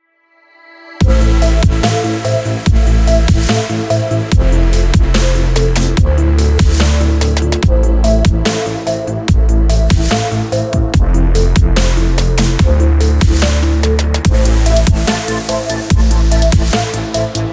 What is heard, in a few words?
dark energy overdrive